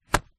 household rubber glove pull finger release

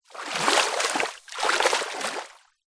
nature water shallow splash creek foot movement

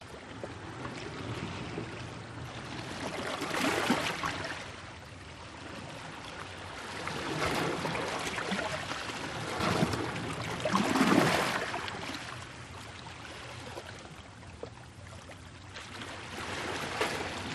Sea between rocks cavity cracks movement

